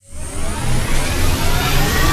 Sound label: Cannon Charge Start